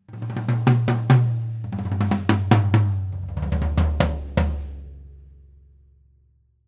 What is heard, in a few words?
tom fill